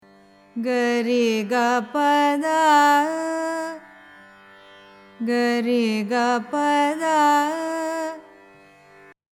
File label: mohanam, carnatic music